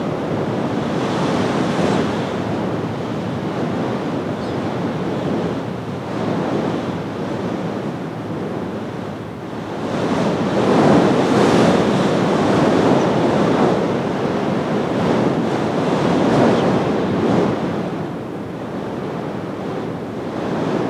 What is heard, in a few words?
Amb Weather; Red Orchestra 41-45